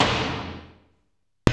snare AARGH, Roland; Roland L-CD701; Snares 1; Sn Modules 1

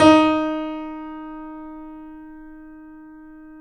D#, The Piano; InVision Interactive - The Piano

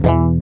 GUI E, Acoustic

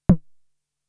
Tom, Toms; Fricke Schlagzwerg; drum machines

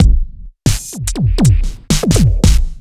Kit Wonk, 85bpm; off the grid

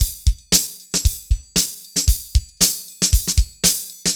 HAPPY, LOOPS

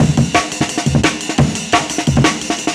DNB BREAK; m8 breaks

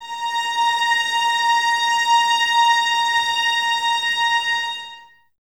Violins A#5, String Ensembles; Miroslav Vitous; 11 Violins

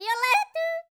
YODEL, World Voices; ILIO; Vocal Planet

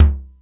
SDS9 LT, Simmons SDS-9; SDS9 Factory A5

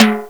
TIMBALES, Korg ddd5 drums

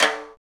Folk Snare Flam; Snares

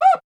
Roland Juno D Perc Menu Kit

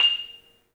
MARIMBA, F; 3D Sound; 3D Keys VOL-1

